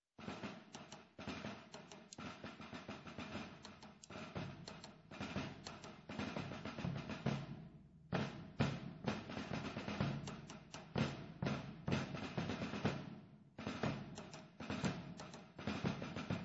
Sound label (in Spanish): palillera, tambores; Andorra